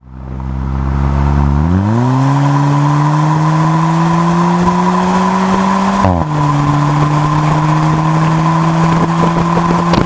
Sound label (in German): sportage beschleunigen 70-130; bastuck